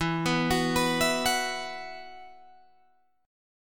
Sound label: E Suspended 2nd, Guitar Open G; 14,16,16,16,17,16